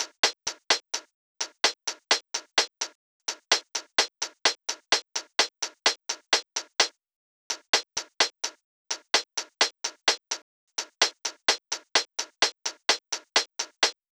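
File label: Loop 128BPM hi-hat; VEC5 Drumloops; VEC5 Multi Loops 128BPM; Loop Elements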